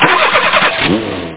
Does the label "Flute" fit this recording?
No